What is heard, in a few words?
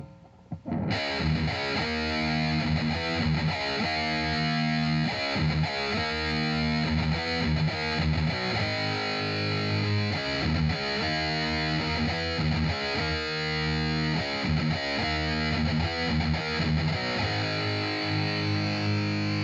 Heavy Guitar